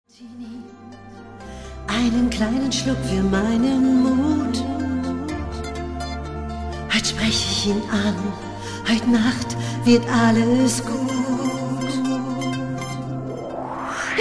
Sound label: karaoke; Tonstudio Sound Universe